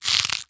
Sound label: Horror Scary Bone Cracking And Breaking Short Fast